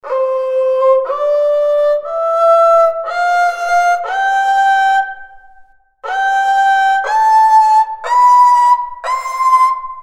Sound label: kemençe çargah